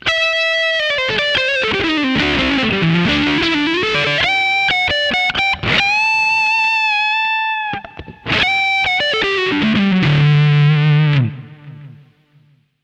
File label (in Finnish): solo strato kaula ja keski; v-twin